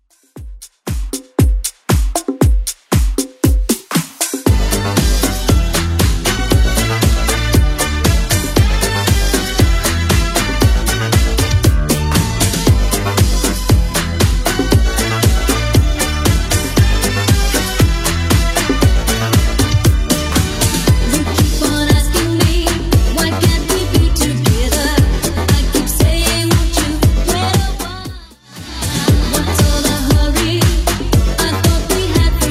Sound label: 80s Club 117bpm